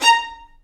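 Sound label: Violin Spiccato Bb4; Digital Sound Factory Virtuoso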